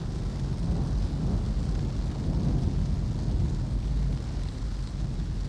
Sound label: Ambience Loop, outdoor